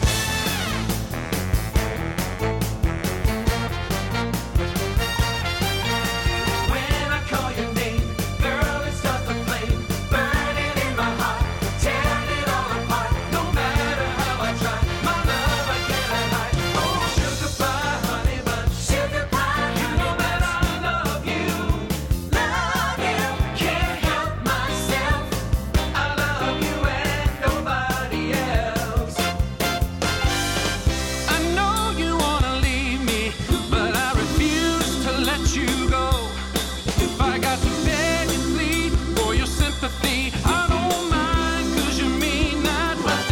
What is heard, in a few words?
Motown Section CHORALE Vocals